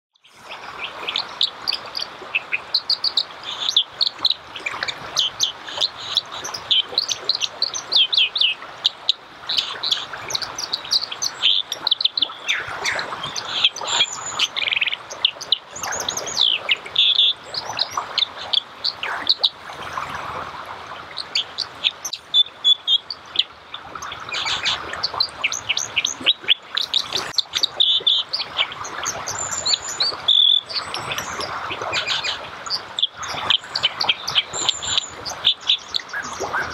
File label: Dippers, زیرآبروک